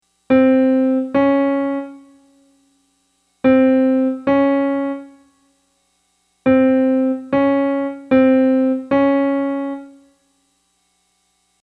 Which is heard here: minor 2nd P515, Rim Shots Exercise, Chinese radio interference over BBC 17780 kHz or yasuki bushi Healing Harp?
minor 2nd P515